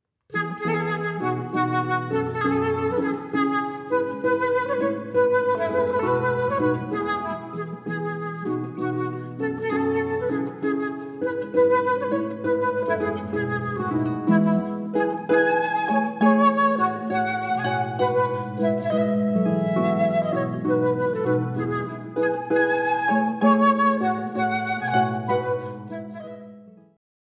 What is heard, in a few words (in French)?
forlane en rondeau